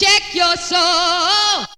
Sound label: VOX SUNG; Megabass Remix! 2